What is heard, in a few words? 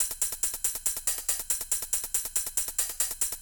beat - hats & tamb, grime, 140bpm; Zero-G Sound Sense Grime Scene; Construction Kits; Its Primetime